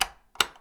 light switch

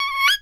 kookaburra

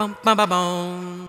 voice bum baba bum; Phonky

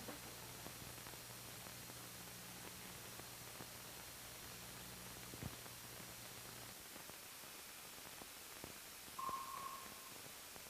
line noise, Vinyl

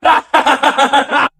laugh evil

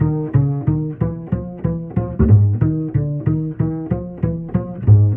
bass, Loops

